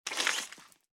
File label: Death Squashed